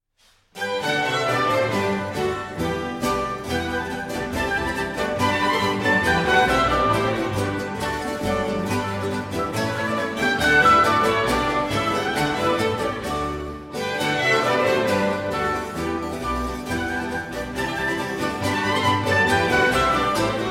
Water Music Suite No. 3 in G Major; HWV 350; Handel

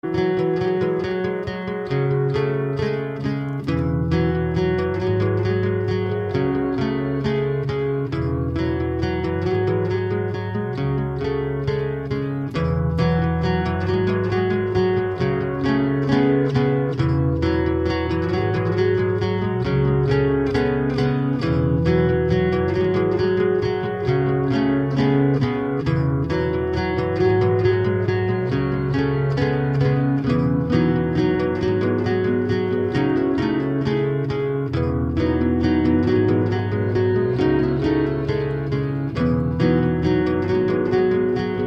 Acoustic

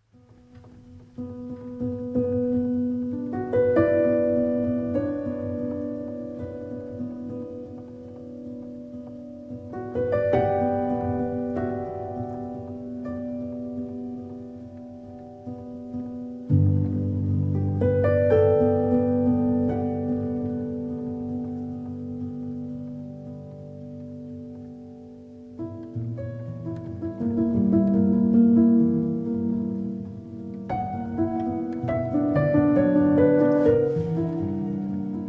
Prelude in B Minor for Piano; Op. 28, No. 6

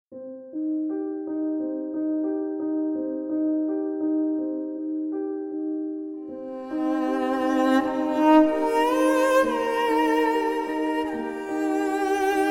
Piano Cello

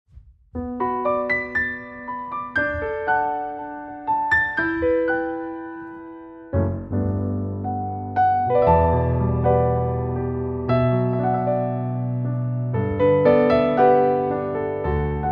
Piano Version

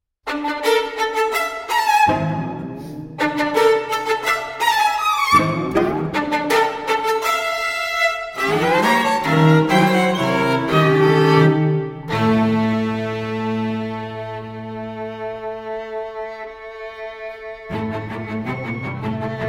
String Quartet; No. 1 Pictures From Warsaw III. Battle

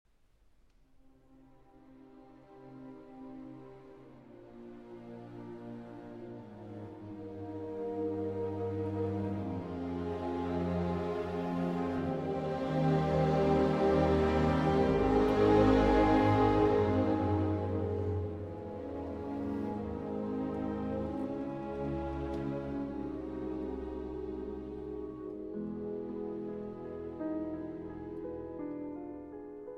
Piano Concerto in C Minor, Adagio; Rachmaninov; Op. 18; Sostenuto

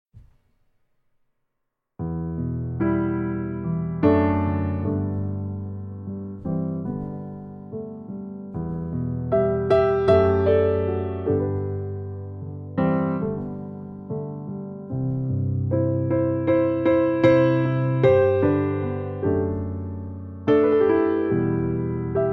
Piano Version

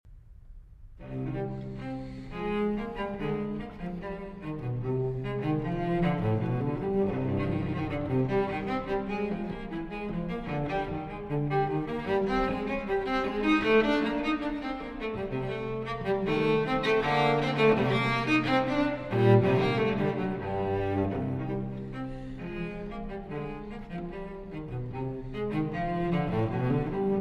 Cello Suite No. 5 in C Minor, Gavottes I & II; BWV 1011

